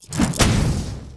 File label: dice; game chaosbear